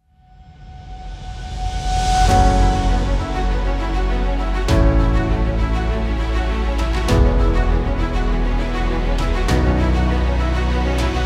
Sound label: Emotional inspiring epic trailer